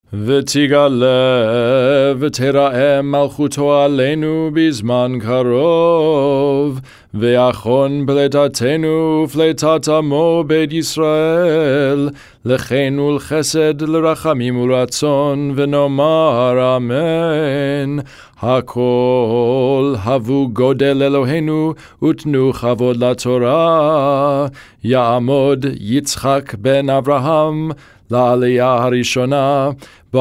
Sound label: nusach major minor; v tigaleh